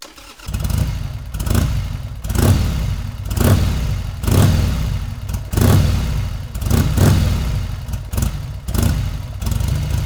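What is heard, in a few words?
motorbike, Ambience; Project Gotham Racing 2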